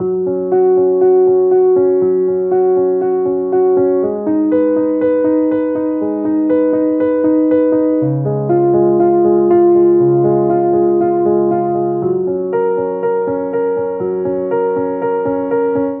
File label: EMOTION PIANO LOOPS, 120 BPM, F